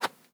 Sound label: Classic Swish, organic wooshes; Micro Pack